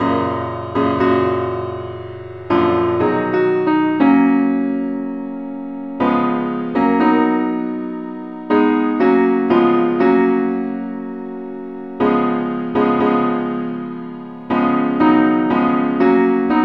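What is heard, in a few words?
simple accompaniment keyboard; pilot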